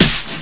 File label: lofi snare; single hits